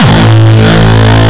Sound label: Longkick, kicks